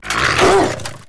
attack light; kusak

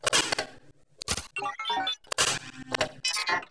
manipulation generic

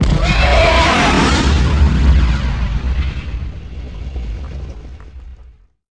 explode tie sentinal

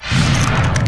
force choke start

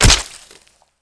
explode; jundak

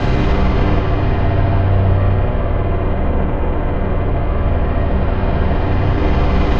spc attack razor wire

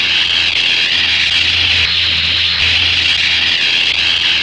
spc attack electric field medium

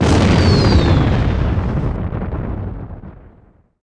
explode ywing

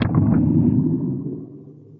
power increase

